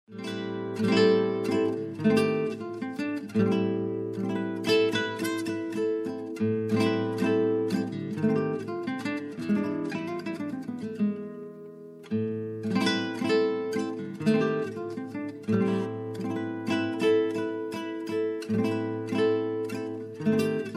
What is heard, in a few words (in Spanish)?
La Rumba